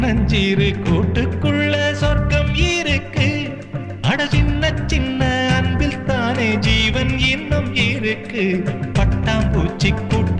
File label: Ringtone, Tamil; melodious